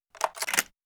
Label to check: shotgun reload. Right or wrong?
Right